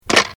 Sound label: Phone Picked Up